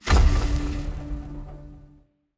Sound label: Scatter Hit; fruitblaze